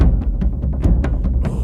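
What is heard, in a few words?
Music Shield; From Dust; PS3; Xbox 360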